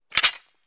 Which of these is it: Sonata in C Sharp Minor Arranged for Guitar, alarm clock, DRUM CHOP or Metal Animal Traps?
Metal Animal Traps